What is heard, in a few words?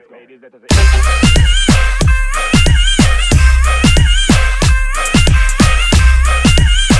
Ringtone